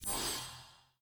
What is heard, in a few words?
p atk heal